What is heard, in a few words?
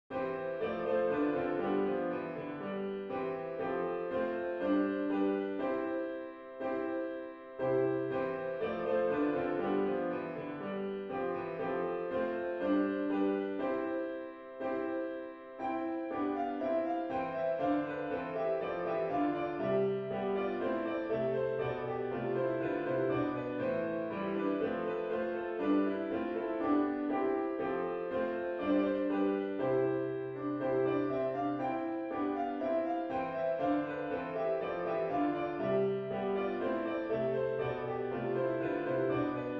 ding dong merrily high